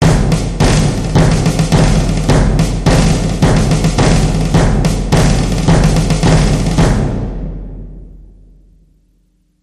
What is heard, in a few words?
Military Roll